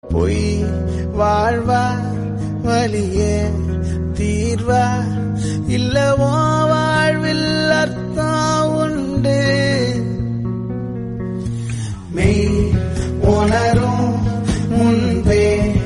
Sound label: Tamil Song; Manithan